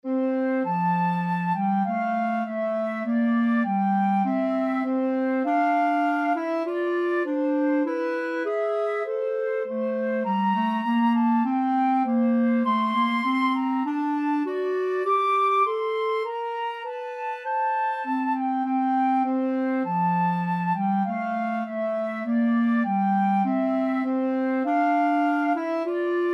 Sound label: i heard the bells, Flute-Clarinet Duet